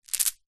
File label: bracelet beaded place down